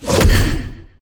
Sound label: princess ranged cast